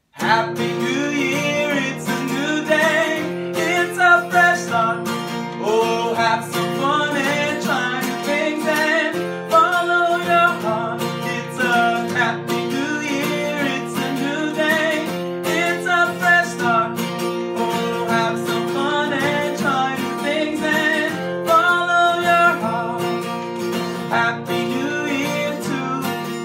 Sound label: happy new year song for kids ringtone